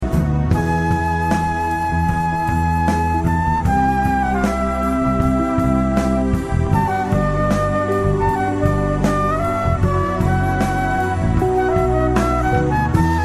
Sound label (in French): solo flute et guitare